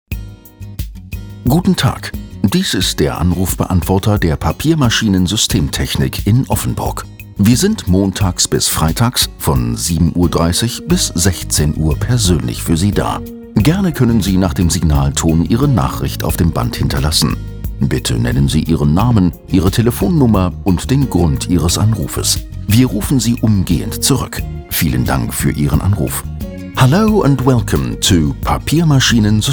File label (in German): AB Musik